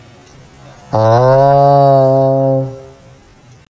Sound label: Tricerotops, dinosaur roars